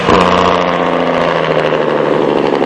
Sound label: jake brake